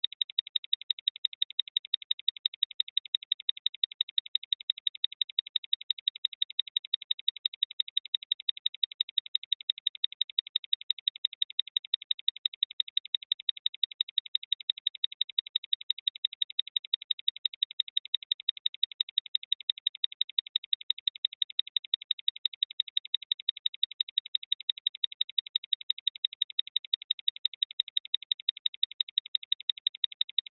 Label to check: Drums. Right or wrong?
Wrong